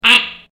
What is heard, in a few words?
Mako Throw